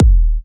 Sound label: Kick, Kicks; FLPRO